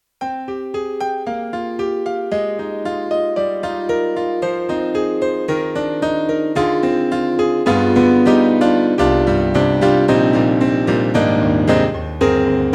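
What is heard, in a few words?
YAMAHA MU1000EX Bright Piano K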